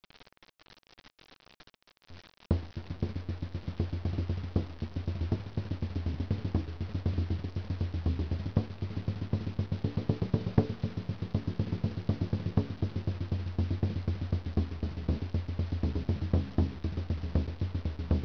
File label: bodhran